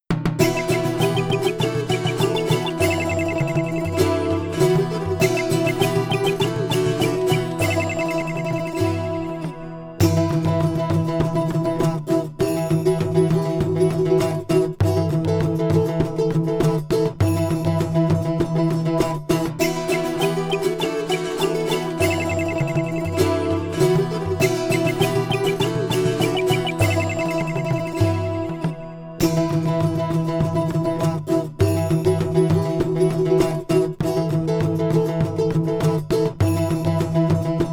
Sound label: Ethnic Music